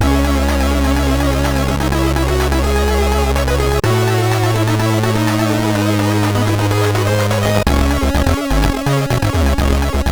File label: Digi Test sid CSG8580R5